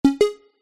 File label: multimedia game sound synth digital two tone beep